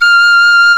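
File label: penny whistle VIB F2; Exotic Winds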